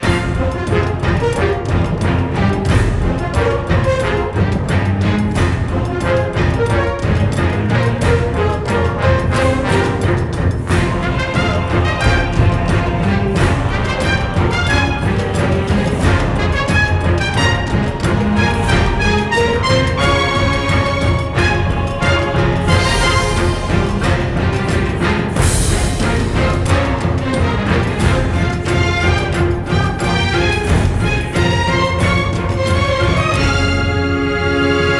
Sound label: Motivational Orch; Crysis